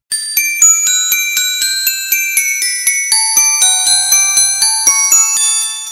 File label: Small bells